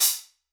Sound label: Soft Glitterbomb B, Hats; Airwindows